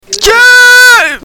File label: chips